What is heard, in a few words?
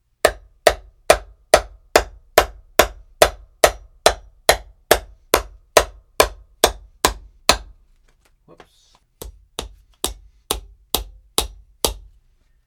hammering